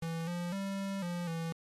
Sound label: sing L8efg4fe